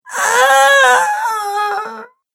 Female Screaming Moan, crying; Crying Sound Effects

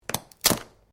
Branches Dead Snap; Material components for constructing more complex sounds; Snaps; Objects

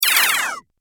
Science Fiction Laser Shooting, laser gun; Laser Sound Effect; Sci-Fi Laser Sound Effect

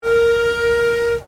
Steamtrain Whistle; Bellarine Tourist railway vehicles; Trains

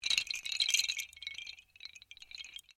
Windchimes Bamboo Small Long; Various windchimes; Musical

